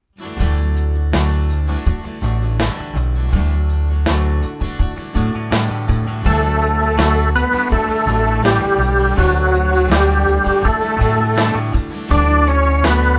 melodic dictation

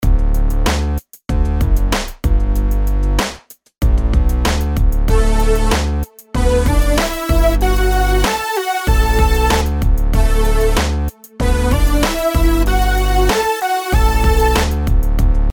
melodies simple Dmaj; melody

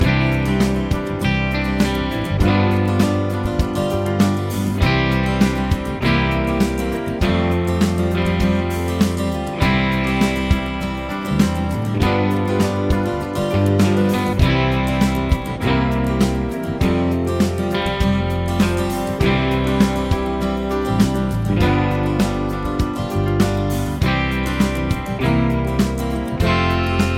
progs with applied chords

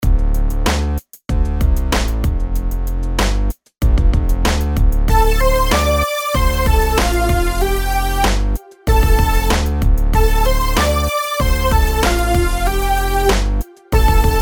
rhythms eighths; simple 8s

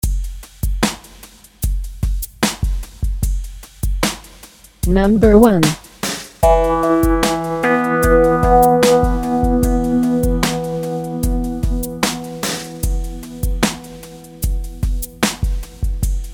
intervals maj up; 8up no drone

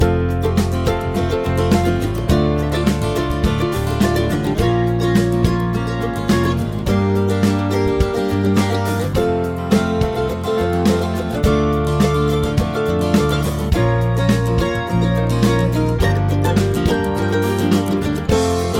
chord prog with mixture

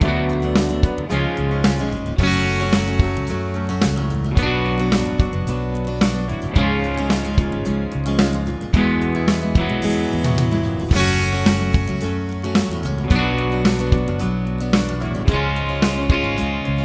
minor key chord triples, F sharp; harmony

